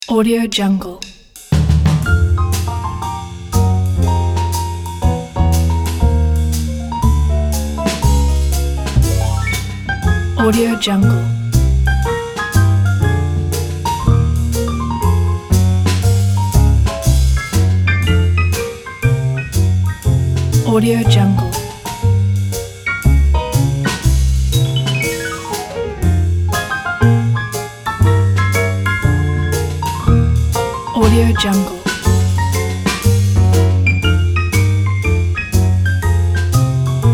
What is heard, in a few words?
classic jazz trio